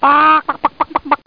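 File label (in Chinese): muji jiaosheng, hen clucking; jiachu jiaosheng; jiaqing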